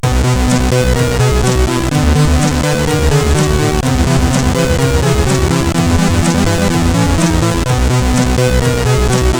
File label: Gliding Beat, CSG8580R5